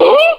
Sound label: huh